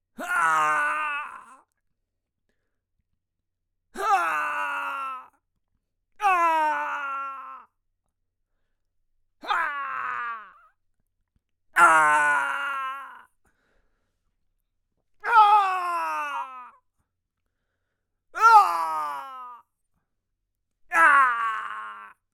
Medium Male Pain Grunts

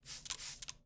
Two Spritzes

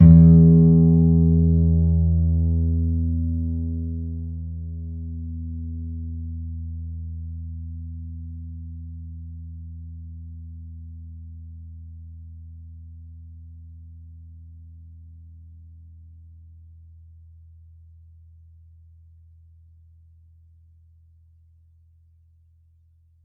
Clean E Str Pluck

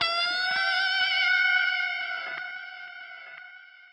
Wah

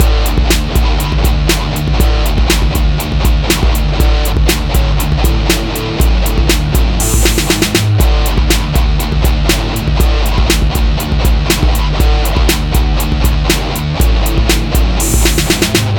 Trashy Loop

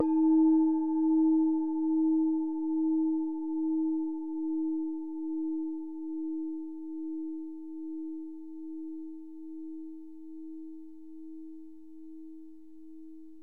Bell Inside

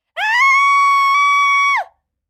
Scream

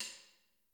Prac Perc

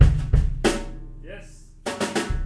Yes Beat Brushes

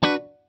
B Minor Skank

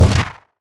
Boom And Rattle

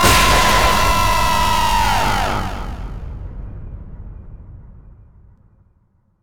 Jump scare

